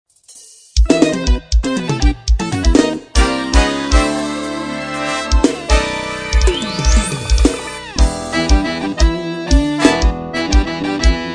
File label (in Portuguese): abertura forró